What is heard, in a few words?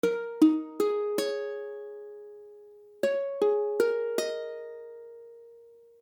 Bbm11, akordy ukulele